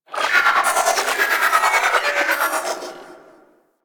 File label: Corpus Ship Mechanical Textures; Ambience; Warframe; Corpus Ship Remaster